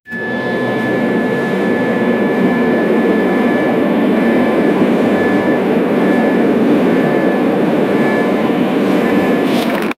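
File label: an old ocean liner blowing, nautical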